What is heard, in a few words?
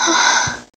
Breath; Lewd SFX